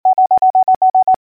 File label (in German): Irrung, morse